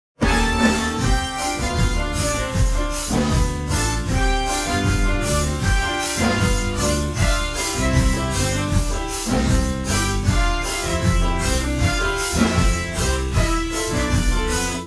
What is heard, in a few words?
Jazz; TSMtoolbox